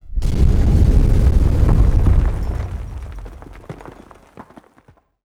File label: office occasional